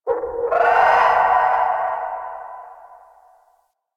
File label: Night Distant Creature Small; Warframe; Ambience; Eidolon Plains; One Offs